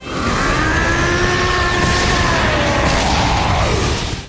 Screamed; Titan Eren

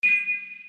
Select Tick

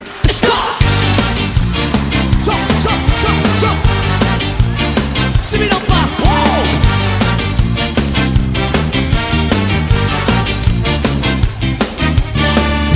ska

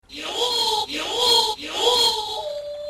Horor

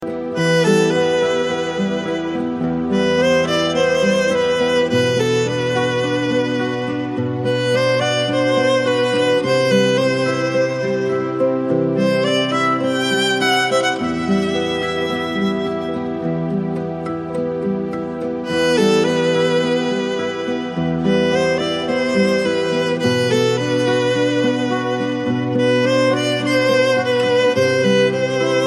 Azeri Music

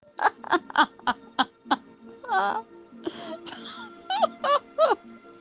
Giggles

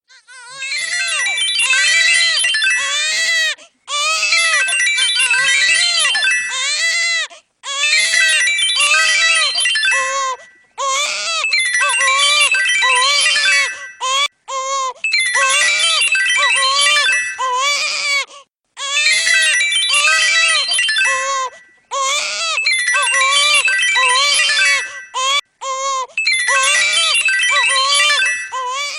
Alarm

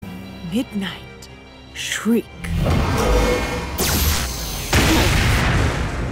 Midnight Shriek

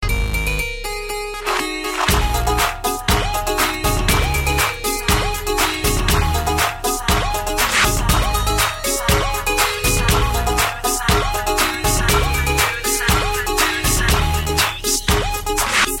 Dhol Beatmix; Lawaris

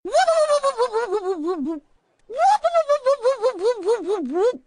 Whoop; Dr Zoidburgs; meloboom

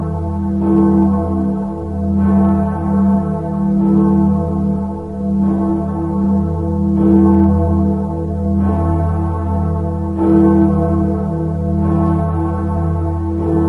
Bell Of Cathedra

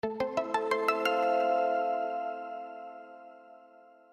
Elegant Ringer

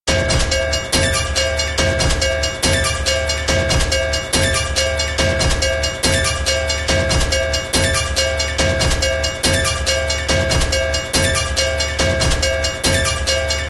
Choo Choo

